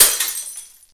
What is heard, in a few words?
Glass Broken